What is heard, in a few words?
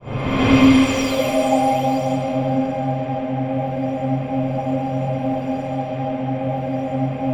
ATMOPAD; Best Service; XX Large; Pads